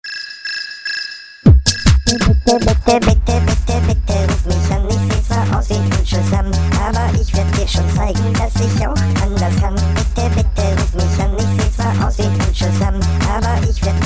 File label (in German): coole klingelton